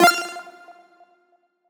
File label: Riddim Synth Hit F; One Shots; Game Over; Ghosthack; Riddim Essentials 2020